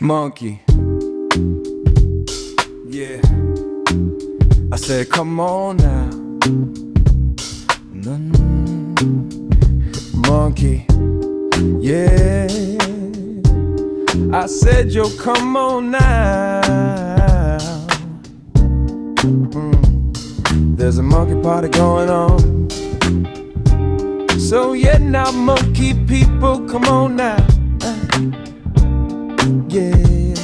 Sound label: Monkey Grooves